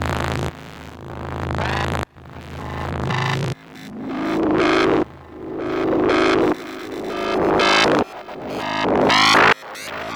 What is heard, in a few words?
texture loop 120bpm; ultimate pack